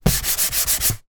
foley hand rub plastic surface; zapsplat

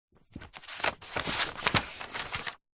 household book wordsearch magazine page turn; zapsplat

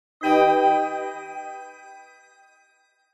game sound reveal magical

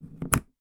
household old fasioned trunk luggage unlock

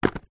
cigarette packet down on table

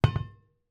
emergency fire extinguisher put down on surface

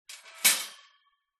shopping cart metal flap close; foley; zapsplat

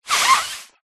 human blow nose into tissue paper short

